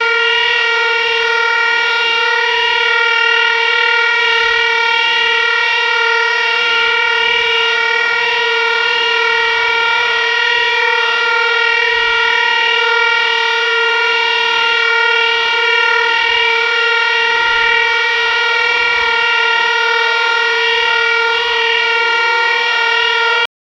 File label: very scary sci fi alarm